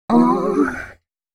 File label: female c#, Dance Ultra Voice FX; Mutekki Media